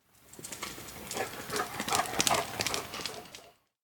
Running By Dog

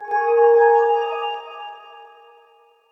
Harp Bell

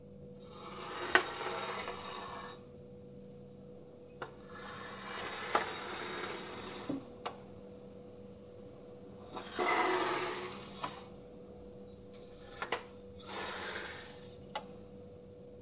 Chair On Tiles Slow

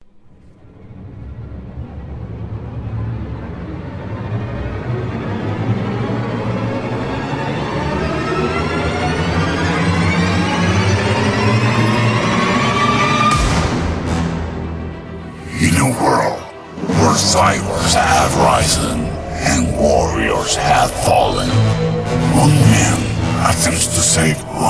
Epic Sci Fi Intro Sounds

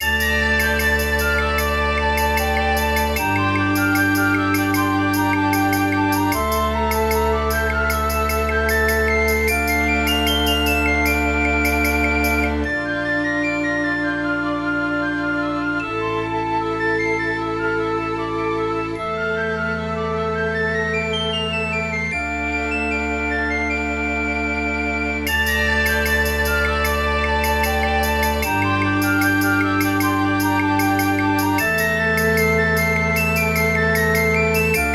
Medieval Tune Knight S Castle

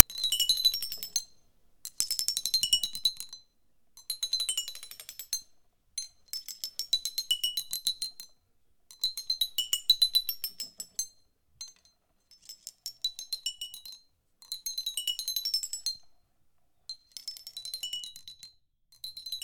Glass Bottles Chiming

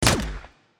Silenced Gun

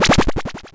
Teleport

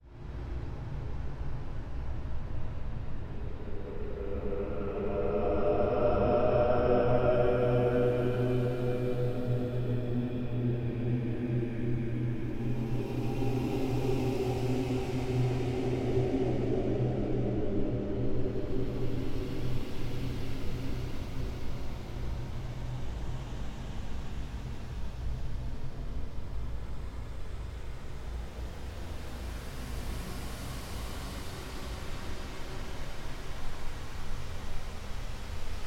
Horror Ghost

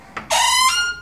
Door Screech

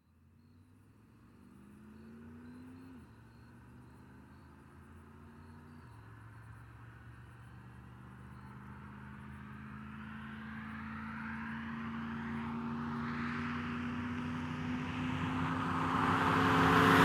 1982 Z28 By Fast

